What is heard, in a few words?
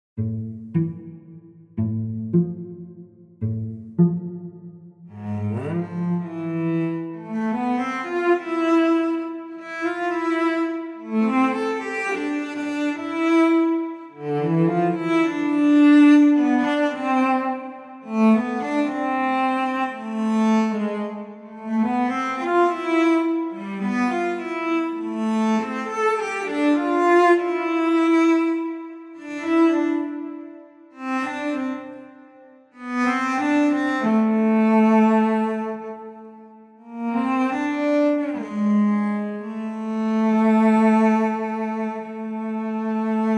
valse; undressed